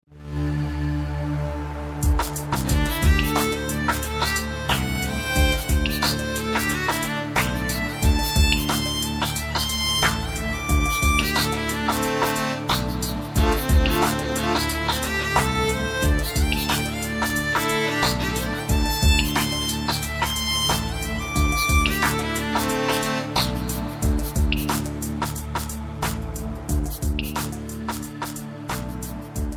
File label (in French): violoneux